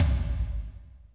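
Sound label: tom, real mega drums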